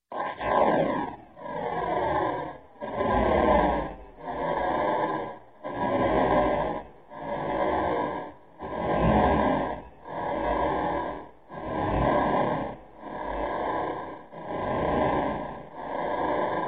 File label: Space Slow Breathing, Electronic; Sound Ideas 2000 Series